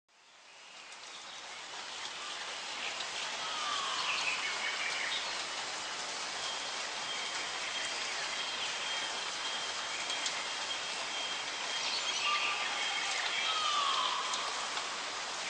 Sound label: tropical bird sounds